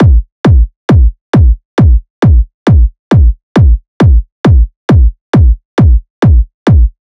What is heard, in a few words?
Kick Loop 135, Techno Drums